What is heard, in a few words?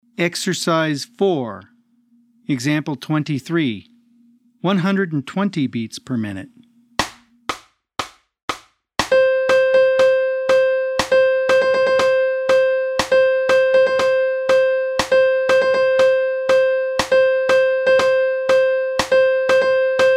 120 BPM